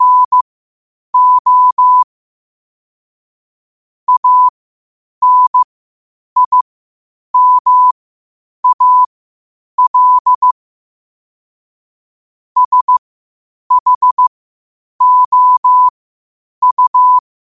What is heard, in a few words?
5 wpm, morse; cw; citas